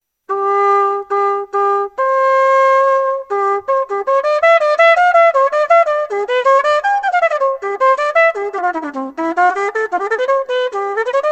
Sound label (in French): cornet a bouquin; instruments